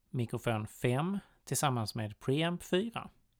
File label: mic pre